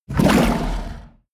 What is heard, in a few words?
kraken growl short; kreaturen